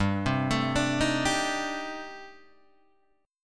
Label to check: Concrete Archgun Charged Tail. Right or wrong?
Wrong